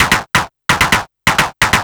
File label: Percussive